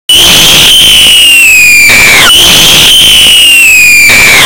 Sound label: screamer